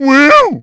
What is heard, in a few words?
Moblin Hooked